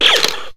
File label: FIRE